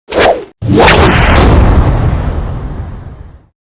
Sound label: swipe; garan